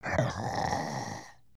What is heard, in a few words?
VO sleep, creatures organic